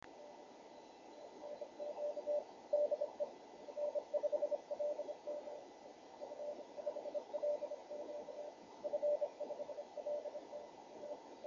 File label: 50.110 MHz CW, ham radio; F5LQ France